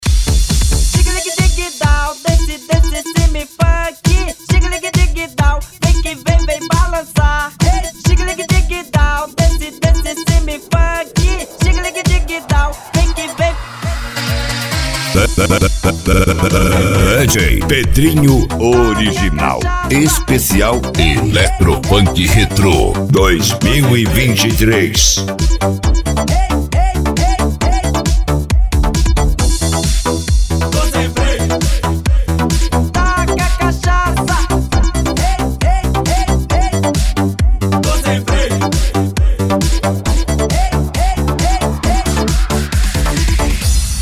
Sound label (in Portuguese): eletro funk retrô